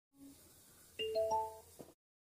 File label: Bluetooth Sounds; وصل شدن بلوتوث